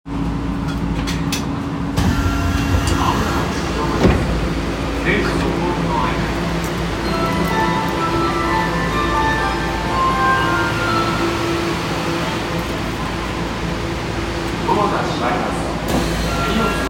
銀座線青山一丁目東, train sounds

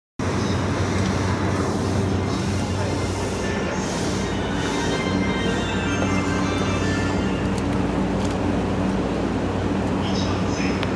総武線 快速 東京 西, train sounds

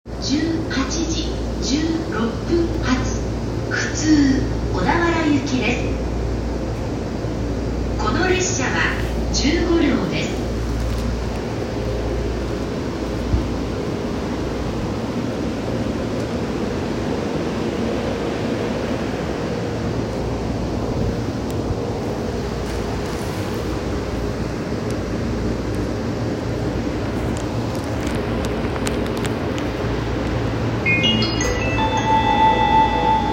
JR東海道線，御殿場線国府津南, train sounds

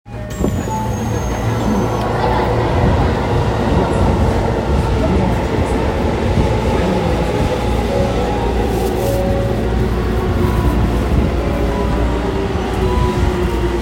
千代田線日比谷東, train sounds

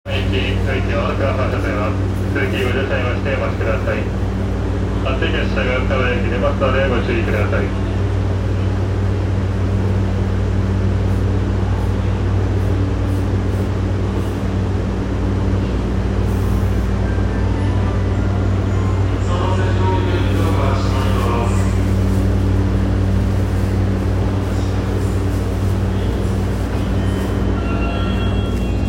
半蔵門線押上西, train sounds

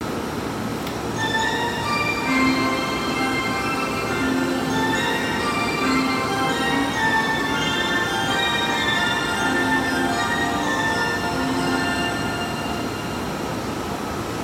京葉線舞浜東, train sounds

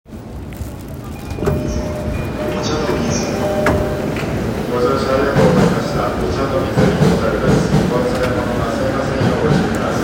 JR中央線快速御茶ノ水東, train sounds

